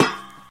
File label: ladder step; Original TK Sounds